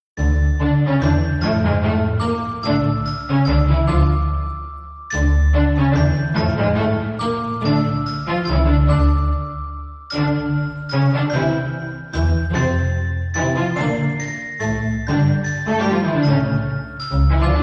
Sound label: Christmas Classical Music; We Three Kings